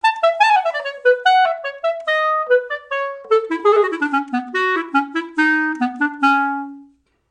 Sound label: Clarinet